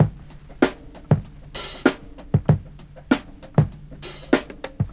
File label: 97 bpm 00s jazz funk drum loop f sharp key